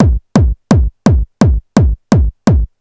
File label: dr kick; Techno Maker SPCS